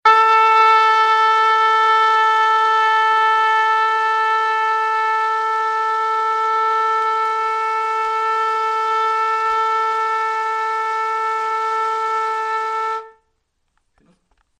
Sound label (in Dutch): TROMP, trompet; meting trompet